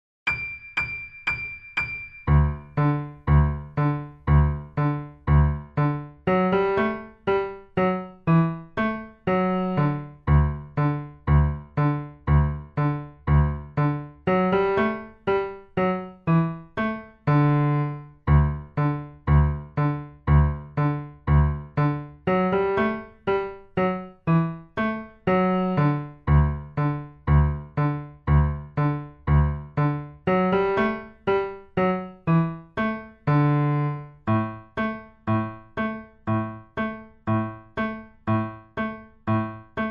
Musette D Dur T120 LH; Bach Johann Sebastian; Notenbuechlein Fuer Anna Magdalena

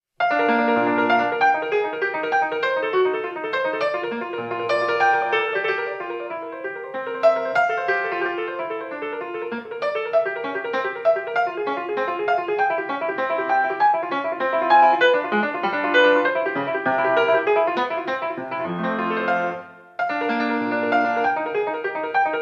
Partita I Gigue mit FX; J.S.Bach; Partita1 Bb

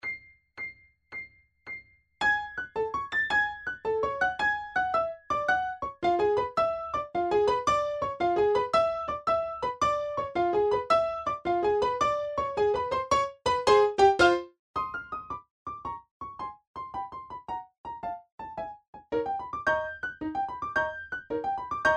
Tarantella Primo T110

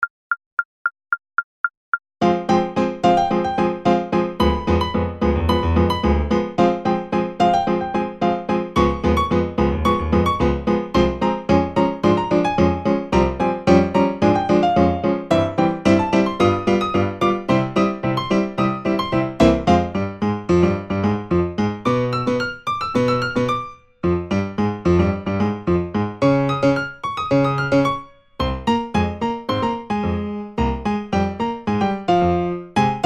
Charleston 110 Secondo Primo; Seiber

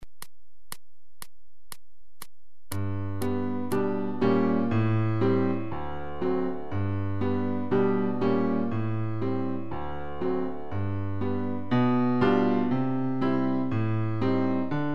Slow-Fox 120; Seiber; Rumba Bis Walzer

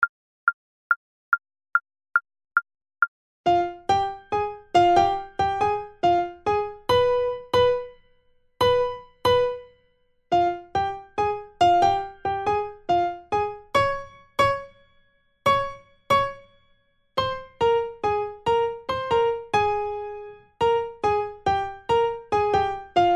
Charleston 70 Primo; Seiber

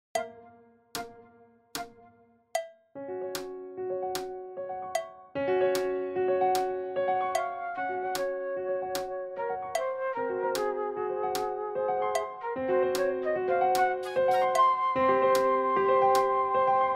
piano flute fast; Uebungs-CDs; Playbacks

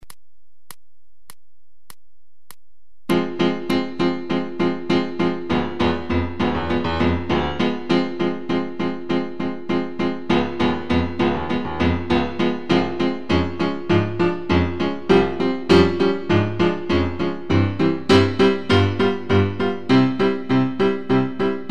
Charleston

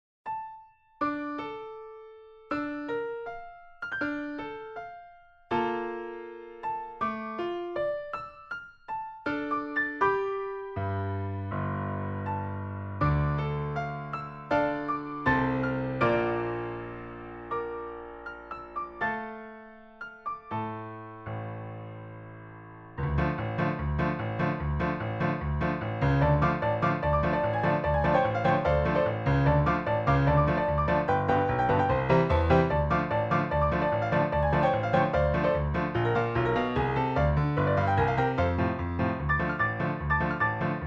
Secondo Primo Fast

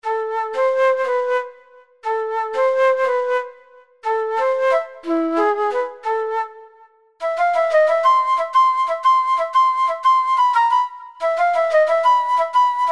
Flute; Wye T

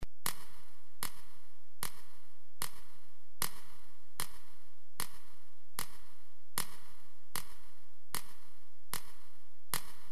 Birdsong; Fuchs Seasons

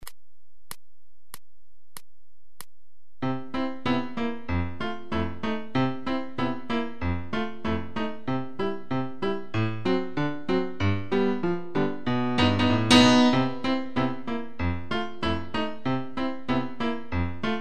Foxtrot 95

